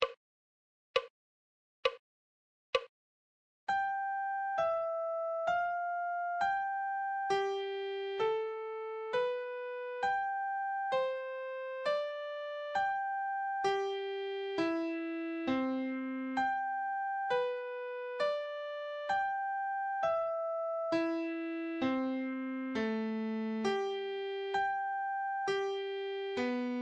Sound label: musical reading in treble clef Adagio